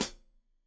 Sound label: Hat Foot, Hi-Hat, Cymbals Stereo